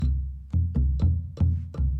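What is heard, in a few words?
Calm Far, music; from dust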